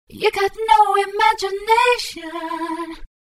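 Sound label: unison voice clones vocals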